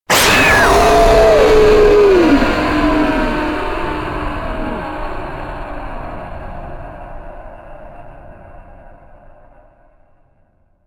Demon Death; Nudin; The Dwarves 2016 ps4 gamerip

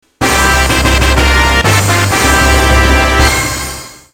Race Start; Snowboard Kids 2

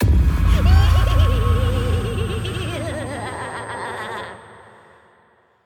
Grab Teammate; Devour; devour 2021 gamerip pc macos

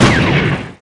Tank

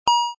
Prize Wheel Tick; Sushi Cat 2

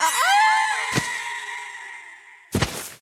Witch Death; Puzzle Chronicles; PS3; PSP; X360

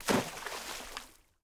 Splash Small Mono

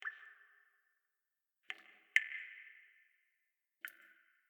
water drip interior; adams venture origins

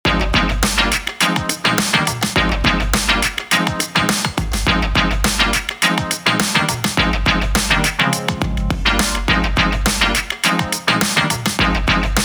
FUNKY RADIO LOOP; Lost Child; Infinia

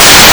explode; full metal democracy gamerip

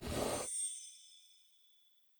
window open with shimmer

